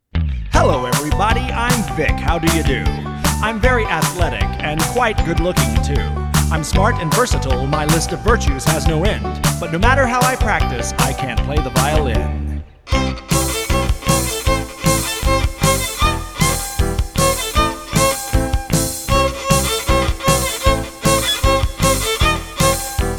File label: Violin